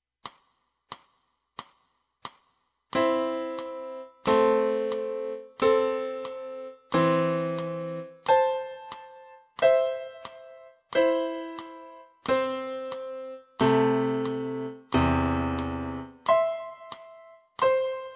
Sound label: piano